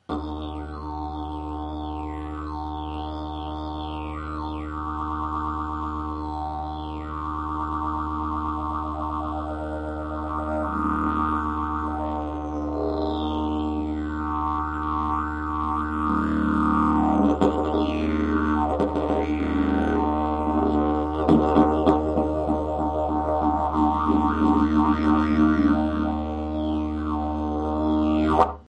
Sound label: didgeridoo